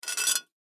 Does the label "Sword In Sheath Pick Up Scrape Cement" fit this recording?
Yes